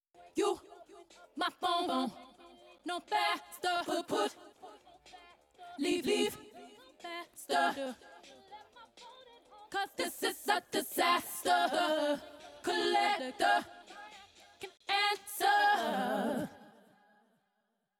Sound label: backing vocals